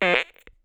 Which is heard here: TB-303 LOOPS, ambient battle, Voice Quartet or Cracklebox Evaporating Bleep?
Cracklebox Evaporating Bleep